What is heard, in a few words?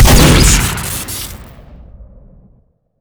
scatter gun shoot crit; tf2